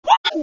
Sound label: woow, Crowd